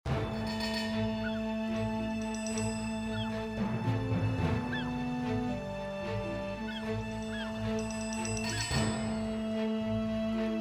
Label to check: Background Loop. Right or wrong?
Right